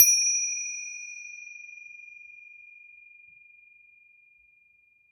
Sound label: Chinese Bells; Percussion Samples